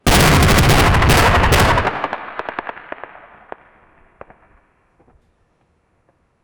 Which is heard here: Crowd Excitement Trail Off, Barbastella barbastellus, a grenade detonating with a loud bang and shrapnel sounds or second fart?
a grenade detonating with a loud bang and shrapnel sounds